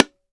bongo heel; CUBA

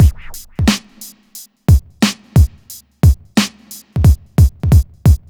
BEAT, Hip Hop R n B; USB Soundscan vol.19; AKAI

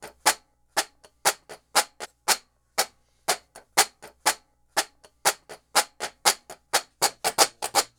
Washboard, Appalachian

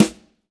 sfx perc

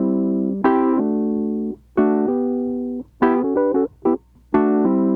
Mixed Berry Jam Wurly, 70s Analog Funk; Sonic Foundry (Sony Creative Software) - What it Is! 70s Analog Funk

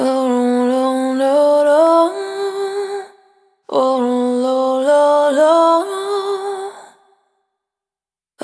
Vapor, Single Voice Melodic Loops

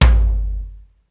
Studio Tom, Ensoniq ASR-X Pro

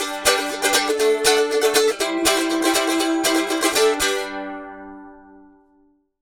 Mandolin Rhythm; Continental Drift Aftershocks

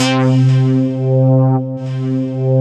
C3, SynthLine

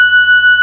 PAD Geige; Best Service Gigapack 1

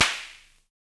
Snare, Univox SR-95

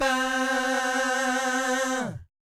VIB FX, Vocal Planet Jazz & FX; ILIO; JAZZCOMBO

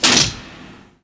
METALLICS; Northstar; The Wizard VOL-1

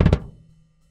Doublekick Loop; Airwindows

